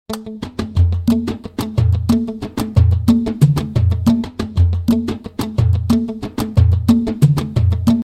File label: arabic hand drums; dance